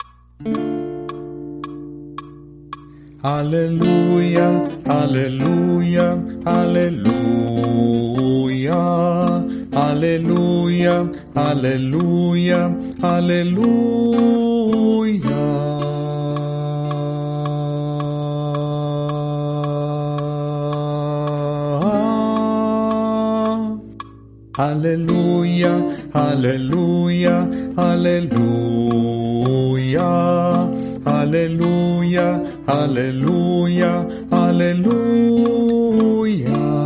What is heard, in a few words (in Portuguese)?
baixo